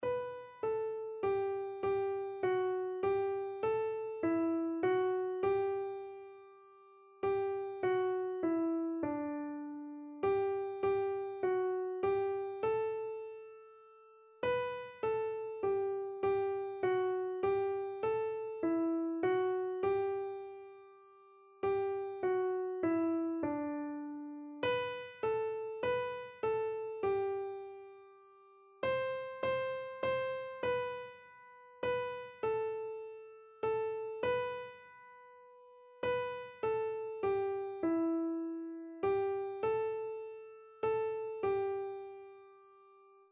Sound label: faith of our fathers, keyboard